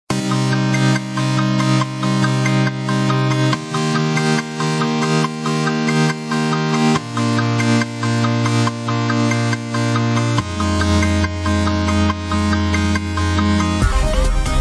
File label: Party Synth